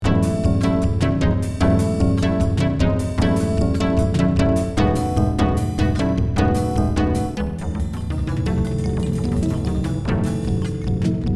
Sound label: tribal arp